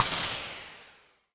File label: Frozen Phase, Impulse Responses; Medium Spaces; Apple